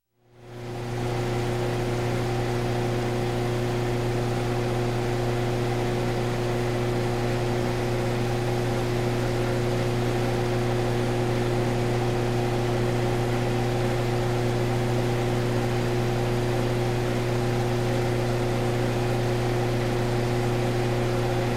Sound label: Power Plant turbine Public Address In Bg, Factor; Power Plant turbine Public Address In Bg, Factory, Industr; Sound Ideas 2000 Series